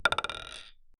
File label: a single plinko ball land